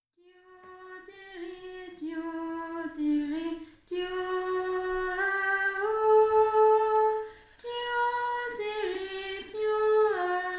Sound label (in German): 3stimmig